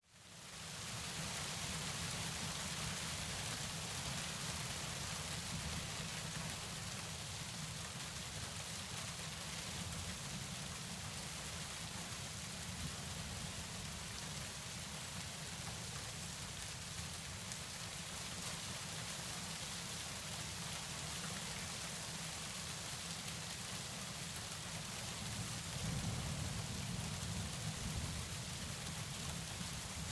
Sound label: Rain; Peace Theatre